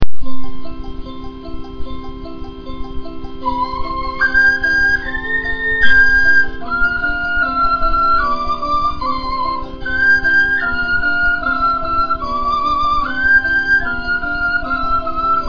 twinkle

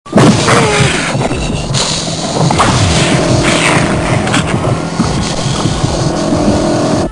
excited

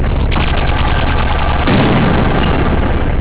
freeze

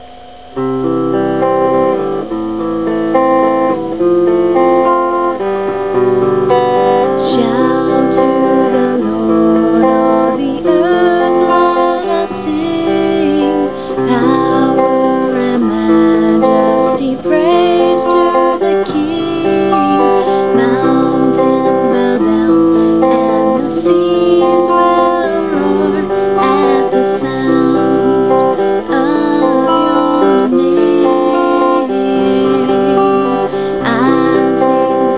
old shout; oldies style

